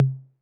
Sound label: Tom Mid 808 Sat